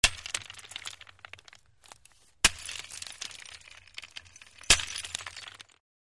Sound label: Sledge Hammer Shatte